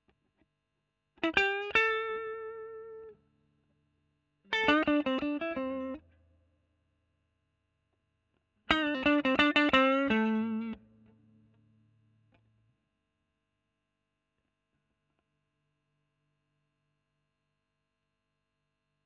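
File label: E.GTR Royer OD, electric guitar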